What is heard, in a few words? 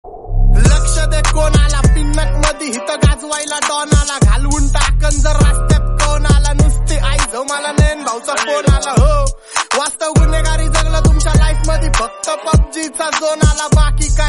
RAP SONG RINGTONE